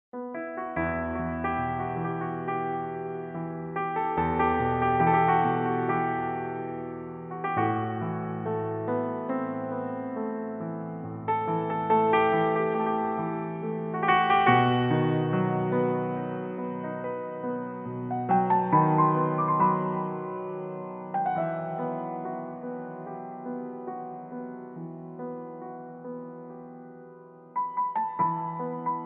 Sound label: Piano Version